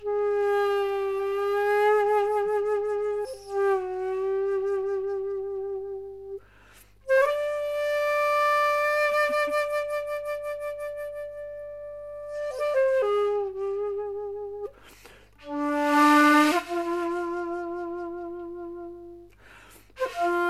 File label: Shakulute C; Tanifuji